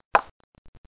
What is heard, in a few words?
cmove; ambush